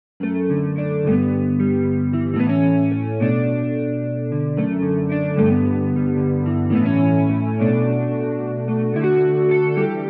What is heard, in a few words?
Overdrive Guitar; VIVO S10